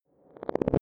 sci-fi flashback; swooshes-swishes

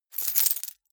key ring large rattle air hard; objekte; schluessel

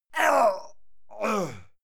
male fight pain; soundpool

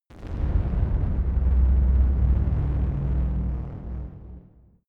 heavy hell door

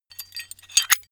metal handling pieces

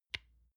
lotion large lid close soft

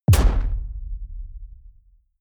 sci-fi space pirate gun fire clean; pistolen gewehre

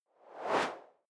whoosh simple steady; swooshes-swishes

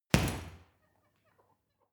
boxing punching bag hit wiggle; boxen

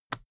briefcase plastic latch lock unlock; industrie wirtschaft; bueroarbeit

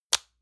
lotion lid close hard; friseur; berufe

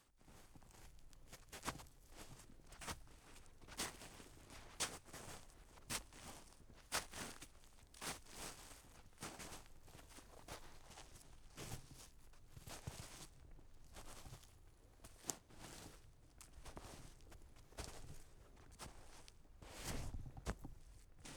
step forest ground snow; schritte; menschen

